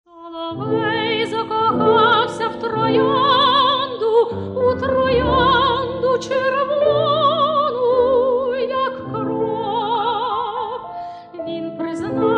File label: vocal miniatures